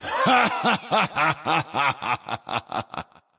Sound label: laugh; 潘森（英雄联盟）; 不屈之枪